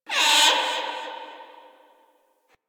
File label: Interior Whiney Critter; Ambience; Misc Indoor; DE; Warframe; Infested Eris